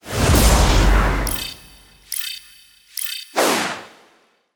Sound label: bonus x2; games; Corrida Romance; Wazdan